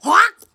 Big Chomp; Midwife

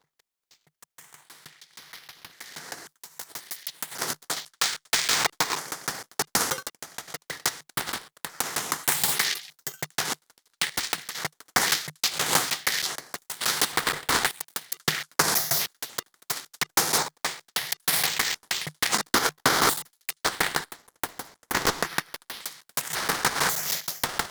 Drums DISTO CRUNCH; Cryptical Path

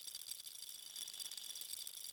Glitchy Ice Loop

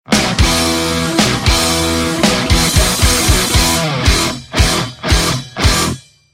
Fanfare (Brass); gamerip; The Muscle Hustle; Android, iOS

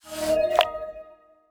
Collectable Notification

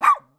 Dog Poodle; unsighted

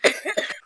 Cough

